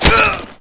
allied die; dod

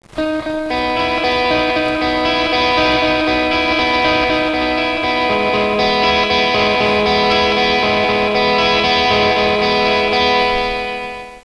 goodend

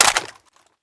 mag out, weapons, vector

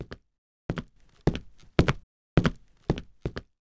walk past; simon

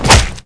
rifle hit flesh

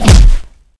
melee hit body, weapons

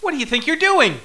scared

